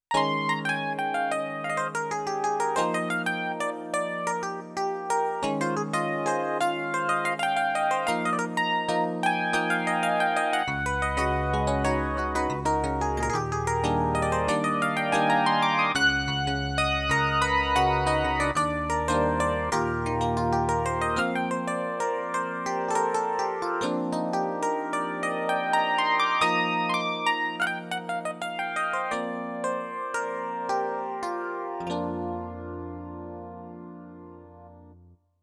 digital e piano

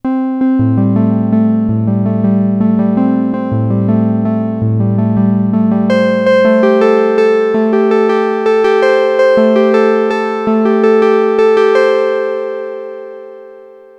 Novation Peak test arpeggio

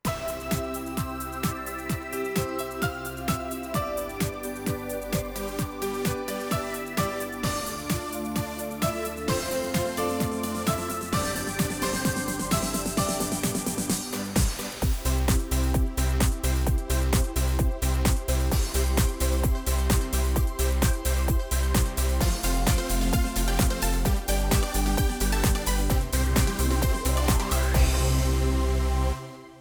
Yamaha Tyros 5 Styles Mallorca Disco

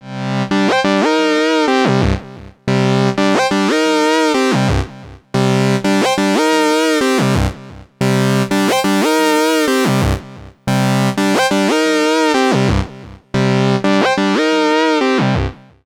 korg minilogue lead

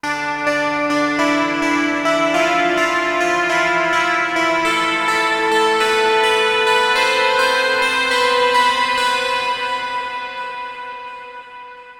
Peak digi lead; novation peak test

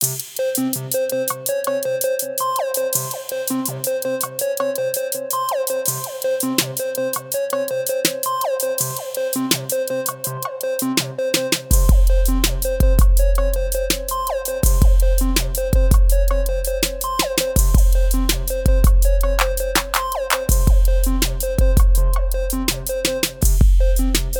Trap Beat Mixdown

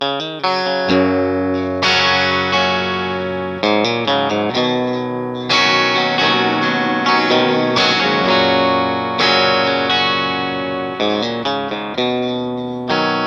clean, asat classic nitro 3-ts

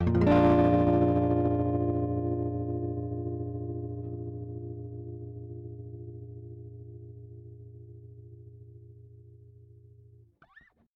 strum dist; eventide h9

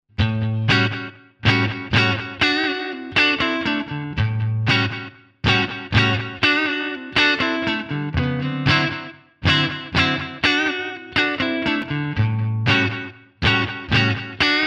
GSP1101 clean; digitech gsp1101

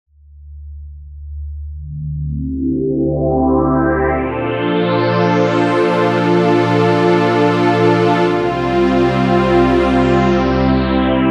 motu machfive 3 synth pad

